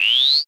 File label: zipper